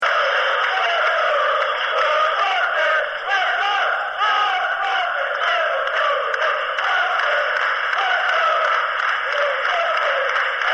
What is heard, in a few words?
we love you sporting